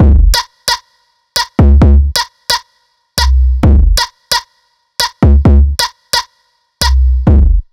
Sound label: BEAT